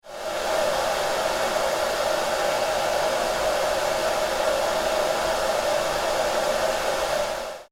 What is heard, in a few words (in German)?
Fön